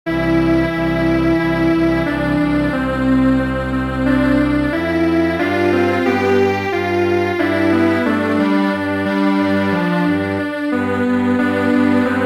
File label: Strings Quartet